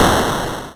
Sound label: Game Over